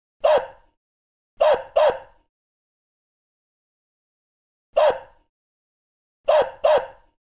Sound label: dog sound